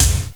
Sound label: BD Gate, Drums